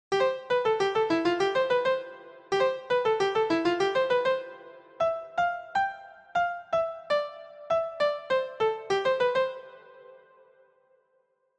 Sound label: mystery tune